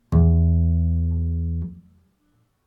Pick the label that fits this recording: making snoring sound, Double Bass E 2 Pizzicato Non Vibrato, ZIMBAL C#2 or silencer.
Double Bass E 2 Pizzicato Non Vibrato